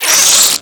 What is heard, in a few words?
item parasite pickup